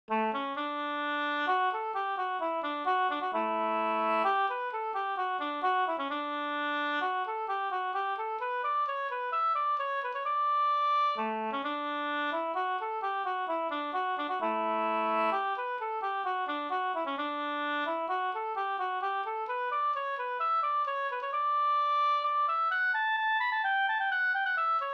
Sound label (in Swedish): polska springdans; fr Amot Osterdalen